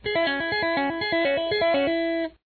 tapping, guitar

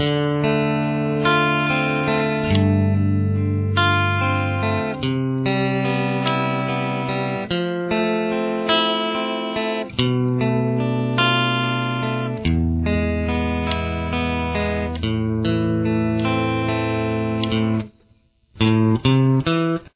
intro rhythm guitar

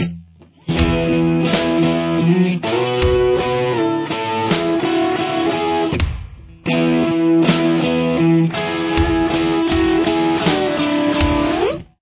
slow, guitar

